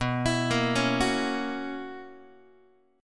B Major 7th Suspended 2nd Suspended 4th, down, Guitar; arpeggiated; 4A,4E,5A,5D,5G#,6C#; x,7,7,8,5,5; JGuitar